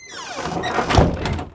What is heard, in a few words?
door close; DAoC; Toa